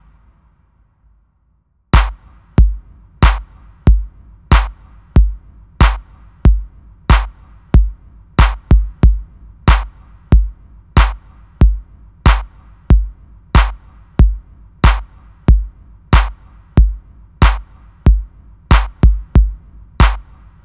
kick clap loop B, Drum Tracks, Smooth RnB

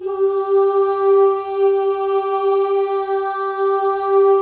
MOVING V1, Symphony of Voices; Spectrasonics

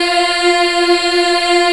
F3 child eh, Choir; USB Soundscan vol.28

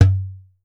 EARTH TN, EARTH DRUMS; Voice Crystal - Steve Reid Definitive Percussion

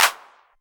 Clap, Novation Drumstation

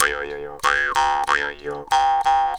D 93, JAW D 93BPM, Primitive Instruments; Didgeridoo and Other Primitive Instruments

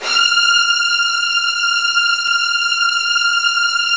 F3, Virtuoso Strings; ILIO; Kirk Hunter Virtuoso Strings VOL-1

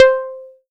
BLEEP C5; Q Up Arts; Dream Experience

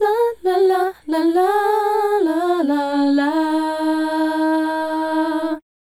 Female Group, Hip-Hop and RnB Vocals

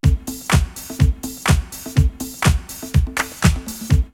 Nite Clap, drumloops, OldSchool; X-Static Goldmine

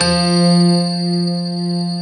GRAND PF F 2, AKAI S-Series; AKAI S-Series CD-ROM Sound Library VOL-7; D.GRAND PF

